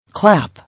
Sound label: Clap